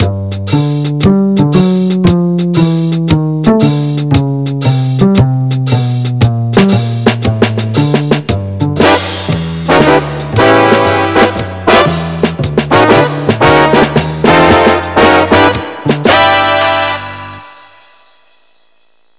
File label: jazz